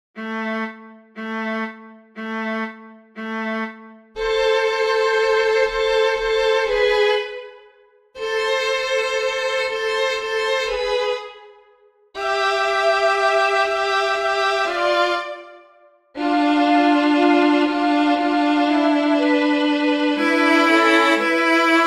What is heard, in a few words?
cello music; Bach; ”Gute Nacht” from Motet No.3; モテットNo3「グーテ・ナハト」